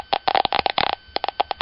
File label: geiger; factorio; UnrealisticReactors